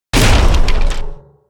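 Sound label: pounce stun; creatures organic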